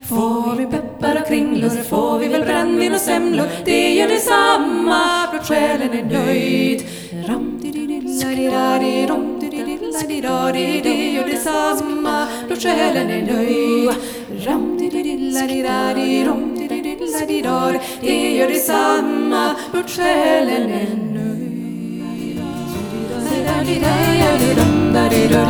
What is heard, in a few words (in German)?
Song Medley mit Ausblendung; Huldrelokk-3